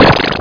frog; ARCADE; cas frog

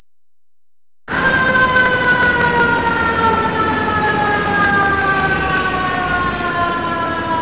fire truk

